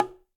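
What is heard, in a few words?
Bongo High Heel; Bongo A H Heel; Latin Percussion; Drums; Drum Hits; Ableton; Factory Packs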